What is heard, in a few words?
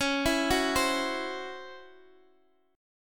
C sharp Minor Major 7th Double Flat 5th Guitar Open D